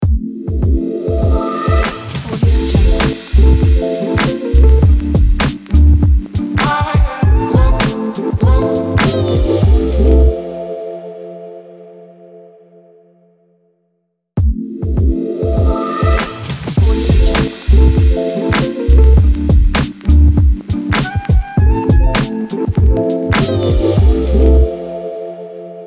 hip hop logo

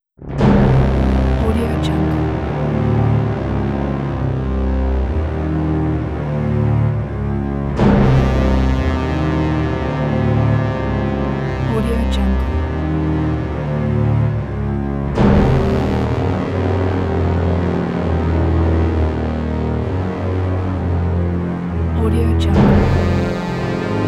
epic evil

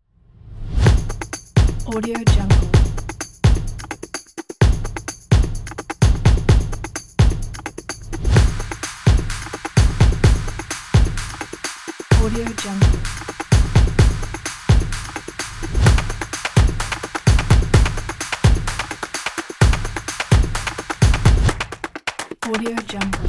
stomp epic percussion

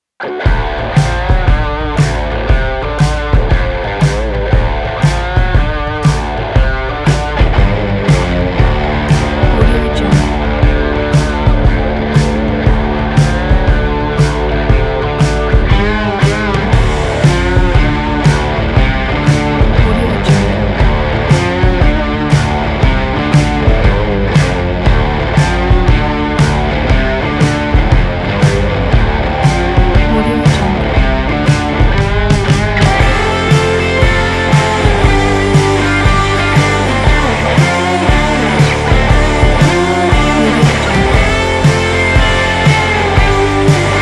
vintage country rock

